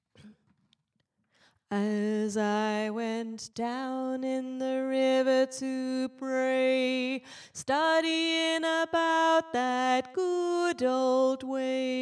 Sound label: Alto, gospel choir; down in the river to pray